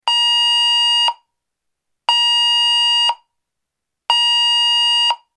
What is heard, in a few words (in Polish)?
ton